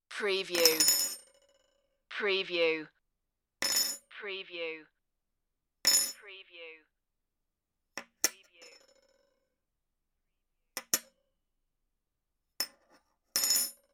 put spoon on table

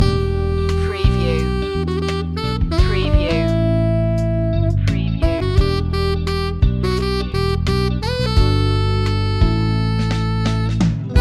waking up flower loop

